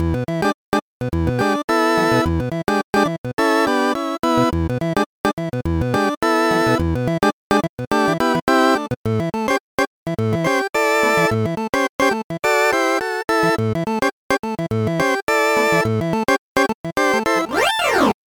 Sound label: Vox